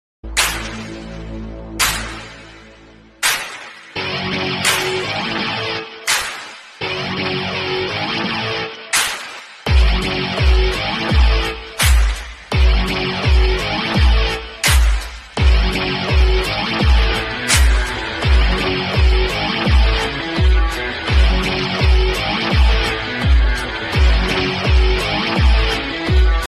dj remix ringtone

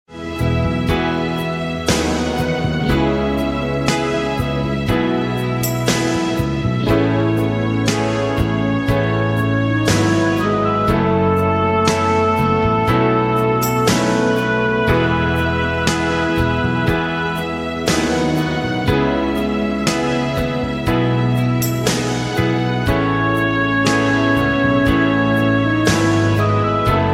hero piano ringtone; Bollywood

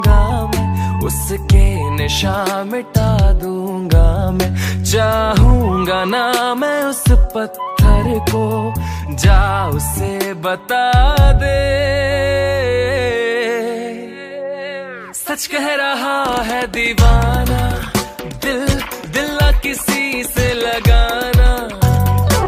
romantic hindi ringtone

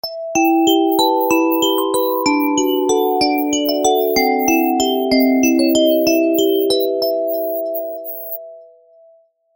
awesome pleasing music tone ringtone

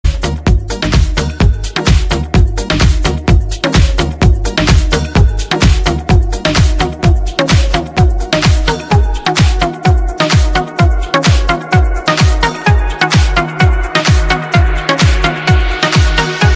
morocco house mix ringtone

